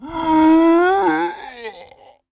orc dead

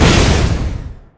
impact

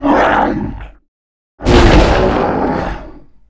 Die

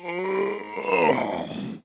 guard dead

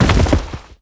DROP

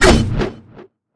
Weapons Swing

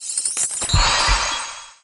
Teleporting cast

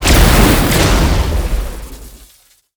Elect Shot

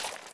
Idle metal small

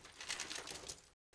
Cloth

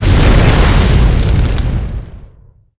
Fire hit

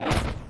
missile acid bolt

